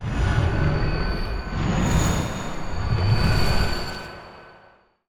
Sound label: sea human surface